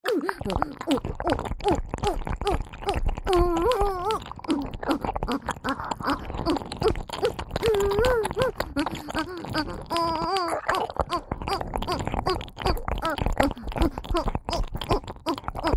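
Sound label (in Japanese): オホ喘ぎ＋ぐぽぐぽW耳舐め; 3WAYループ; SE有; おまけループ（オナサポ）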